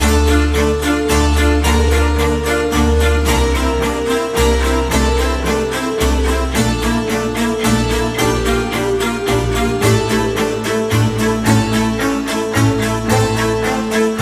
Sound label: soundtrack ringtone